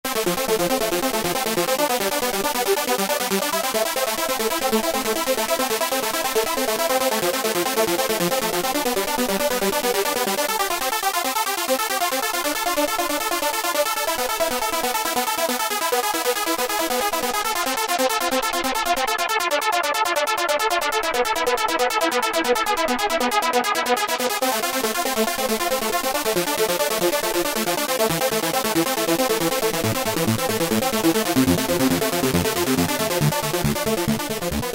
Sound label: Korg microKORG Unison Ring Lead; Factory Voices